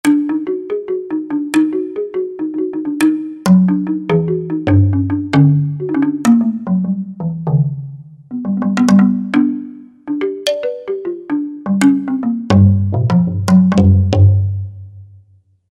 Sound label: Wooden Slit Drum, Korg TR-Rack